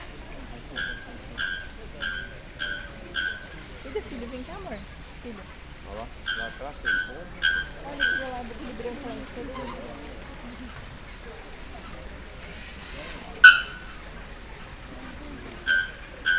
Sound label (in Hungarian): fuvolazo madar, madarpark